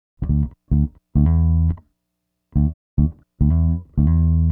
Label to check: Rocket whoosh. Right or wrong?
Wrong